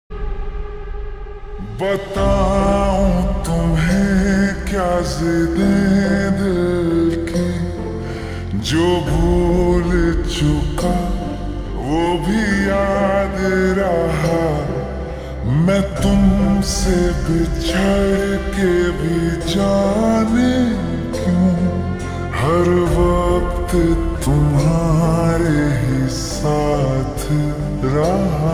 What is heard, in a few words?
Ringtone slowed and reverb